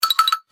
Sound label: xylo slide